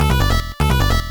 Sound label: beatbox; Pinball Dreams